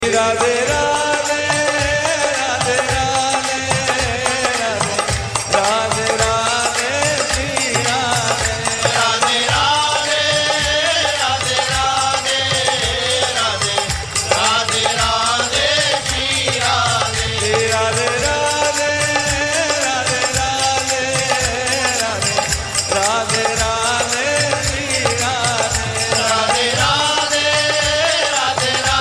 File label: radhe tone